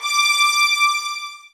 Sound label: SOFT VIOLINS